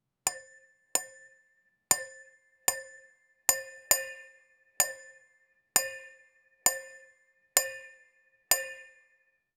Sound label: sound effect of, gap